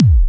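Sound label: bass drum, drum machines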